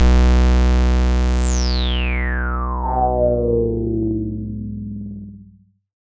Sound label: FX, SCI Prophet-5 synth